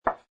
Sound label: Billiard Pool Snooker Place Ball On Table; Pool ball, snooker ball, billiards ball: Place snooker ball onto table; Sports; LynneMusic